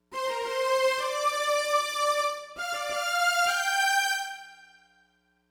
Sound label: Smooth Groove Strings, 70s Analog Funk